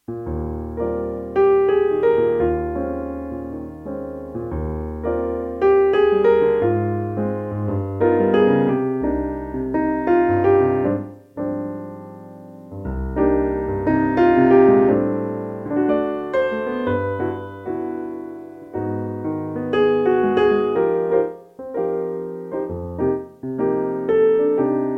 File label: Pianist